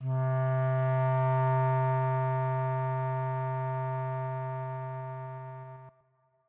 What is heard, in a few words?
tired